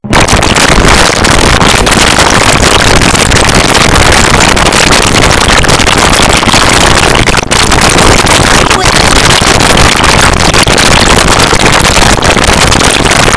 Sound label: music, GSM 44 Khz, mono